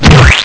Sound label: SLAM